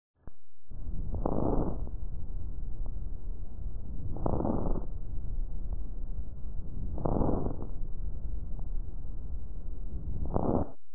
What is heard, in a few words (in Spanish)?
Crepito Lactante Escolar